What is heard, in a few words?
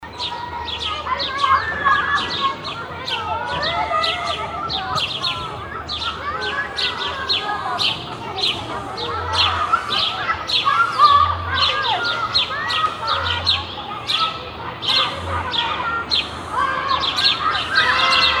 Playground Germany Loop, ambiance